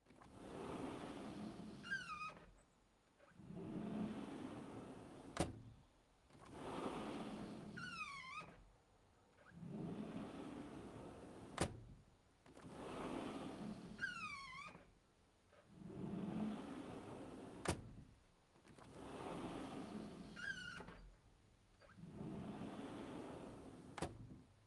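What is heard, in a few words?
sliding glass door open close far soft, exterior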